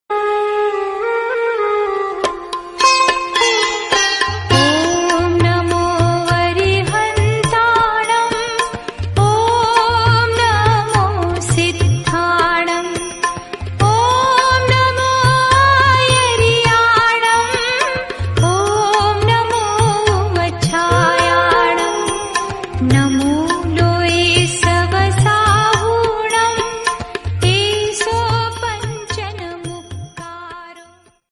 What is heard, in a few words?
bhakti song ringtone; bhakti sagar; ओम नम अरहतनम नम सदधनम नवकर मतर